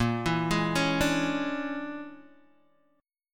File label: Bb 7th Sharp 9th Flat 5th Bb, Guitar Open G; x,3,2,1,2,0